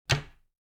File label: cabinet door close